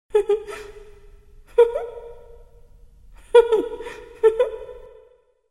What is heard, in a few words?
Laugh; SILENT HILL; Cheryl